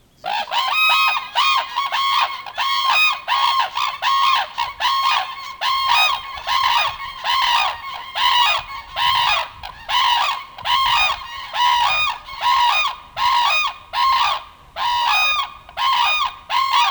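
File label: red-crowned crane voice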